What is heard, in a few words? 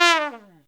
TRUMPET FX, Phantom Horns; Zero-G